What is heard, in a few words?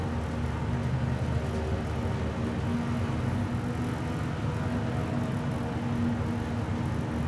bionic uavs